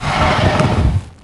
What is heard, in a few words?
Bone Dragon Death Sound; Heroes3